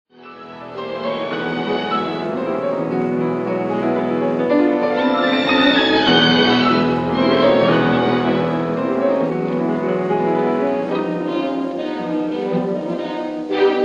Fantasy for piano and orchestra Andante ma non troppo; Debussy; Concertgebouw Orchestra